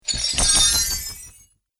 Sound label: Magic Chain Impact